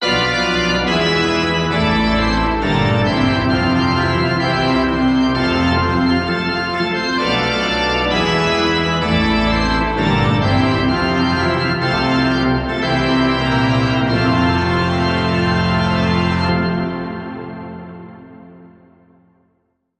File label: Wedding Recessional; Trad (PD)